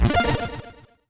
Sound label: score up; Ultranium 3D